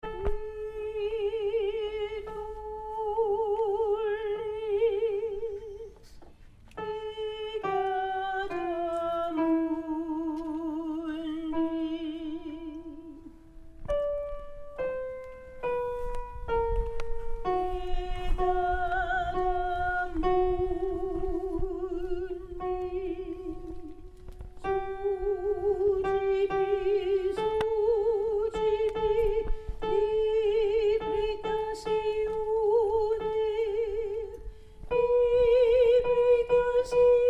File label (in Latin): qui tollis alto; gloria de vivaldi